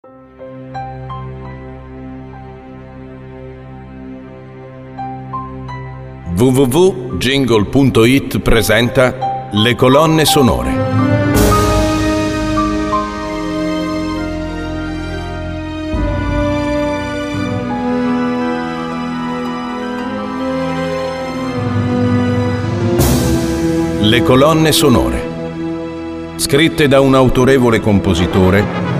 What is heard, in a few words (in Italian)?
colonne sonore